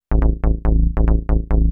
TECHNO BASS 140 A

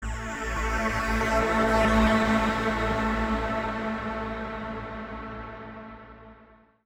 TECHNO FX 140

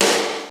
snare drum

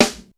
HOLZSNARE, snare drum; SNAREDRUMS

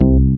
BASS MONO

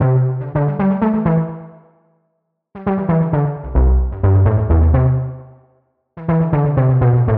C Layaboutnoodle, Bass Loops; Touch Loops; BlissedOutBeats

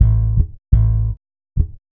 HOUSE BASS 125 A

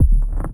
One Shot, Hits; One Shots; Touch Loops; ElementsOne; Abstract Rhythms

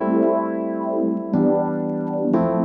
PIANO 90 A; Keys

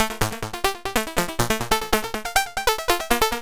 TECHNO SYNTH 140; Keys

